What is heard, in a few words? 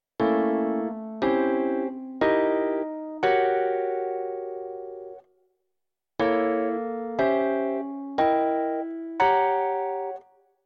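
dim arpeggio, jazz piano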